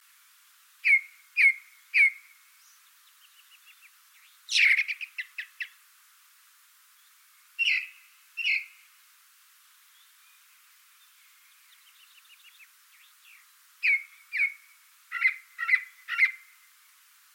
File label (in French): merle a plastron, orn